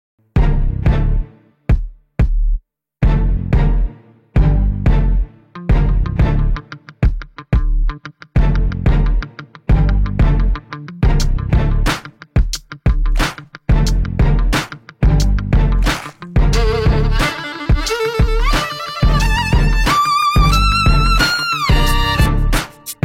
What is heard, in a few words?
violin cover